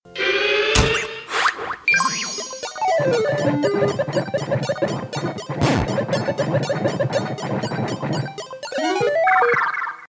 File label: Game Over